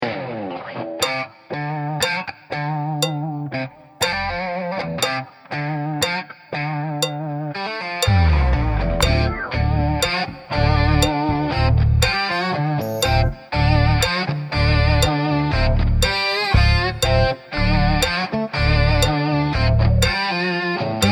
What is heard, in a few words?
Drum Solo Playalong; drumsolobooks